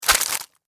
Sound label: Insect Eat Attack, Insect, Eat, Attack, Juicy, Wet, Bite, Bug; Animals:Insects; Hard, Crunch; Insect Attacks; Blastwave